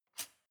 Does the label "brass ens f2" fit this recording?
No